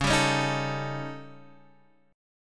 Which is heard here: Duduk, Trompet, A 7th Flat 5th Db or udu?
A 7th Flat 5th Db